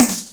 snare fx, DnB Breakz Kit; Breaks N Beats Sound Kit

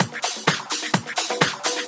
Percussive Loops 128BPM; Vengeance Samples

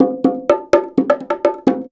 percussion loop 125, bongoloops; house; PS-28 Vocal House Samples

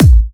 138BPM Kick; VFH1 138BPM The Epic Kit

